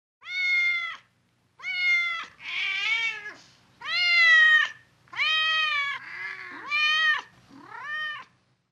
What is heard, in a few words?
animals